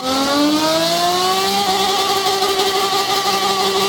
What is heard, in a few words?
rev, vehicles cars; lwcars